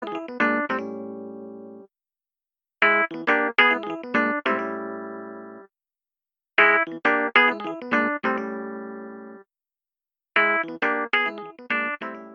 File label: Samsung Ringtone